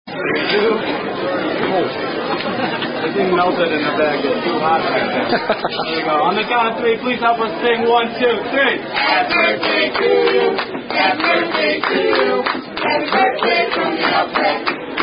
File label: Happy Birthday (Standard) Sung By Wait Staff; Outback; With Clapping